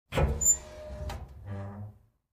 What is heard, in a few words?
Cabinet Door Open